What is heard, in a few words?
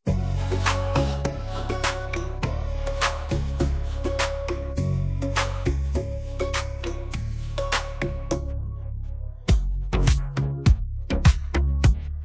Instrumental; Starkóm; piosenki dla dzieci